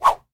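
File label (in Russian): свист летящего ножа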